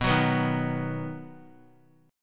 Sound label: B Major, 3C,3F,3A,3D; strummed; JGuitar